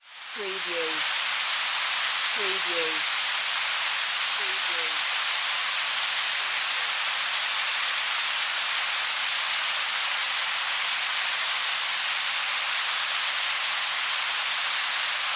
Weather Rain Fall On Water

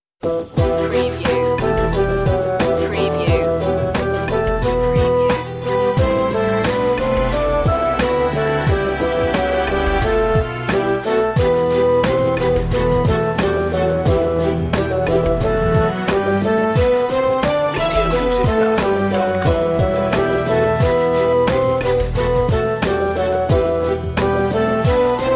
god rest ye merry gentlemen

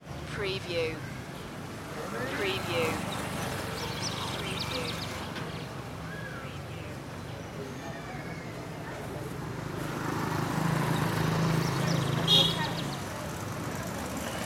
city ambience colombia

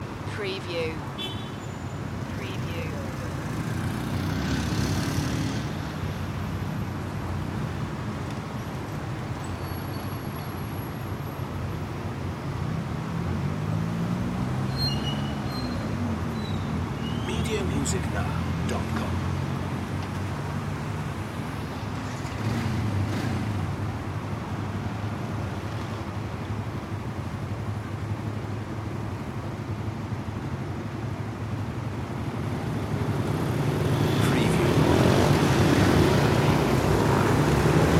busy city crossroads loop

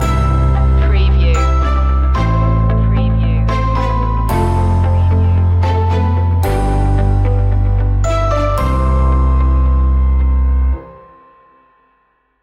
happy go lucky loop end

